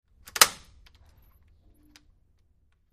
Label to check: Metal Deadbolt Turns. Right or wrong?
Right